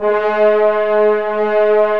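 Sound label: French horn; Roland - LA Composer vol. 1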